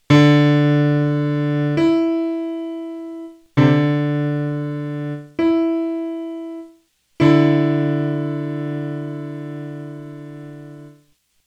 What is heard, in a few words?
Not Octaves, Pitch; Music by the Numbers